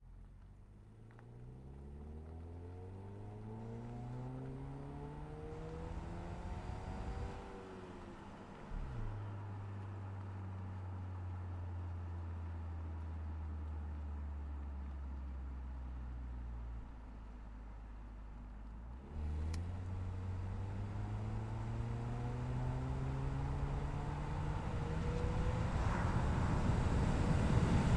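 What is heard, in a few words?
volvo xc90 t8 onboard medium drive stop exhaust right; dpa4062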